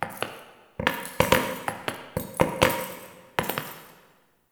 Idle Big Jumps; Tagfer; Warframe; Ambience; Entrati

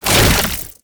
Wall Climb Jump; Warframe; Oraxia

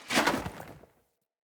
Foley Action Armour Roll; Player; Deora Armour

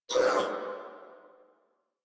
pain crewmen; Warframe; Corpus Crewmen; Crewman B

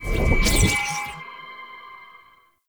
Mirror Portal Beacon Stinger; Warframe; Duviri Murmur

